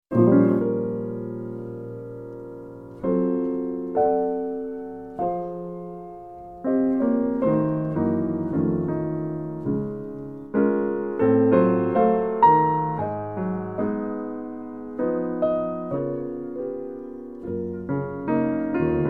minus melody

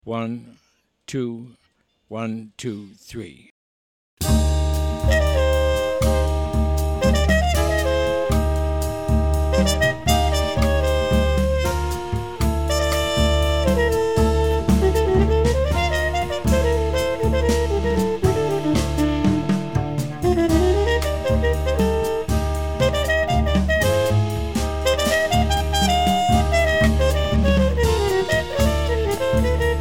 minus guitar

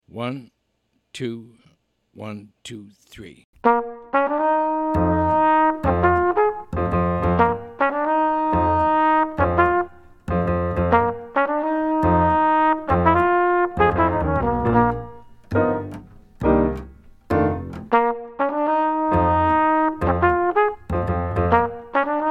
minus drums

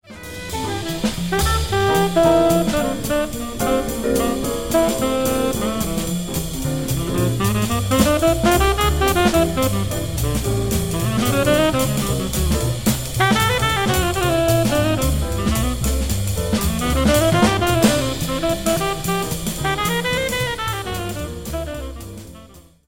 solo

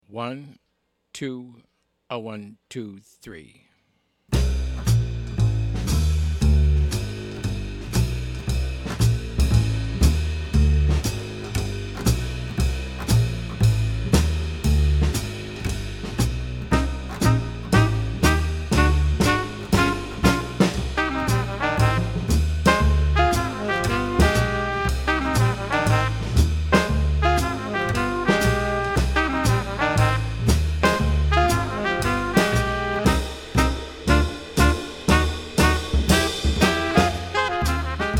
minus piano

